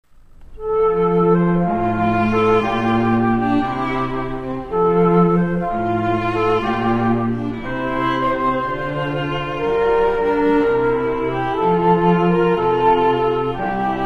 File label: air, trio